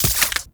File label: Arch Gun Primary Beam Impact Flesh; Weapons; Warframe; Archwing; Hildryn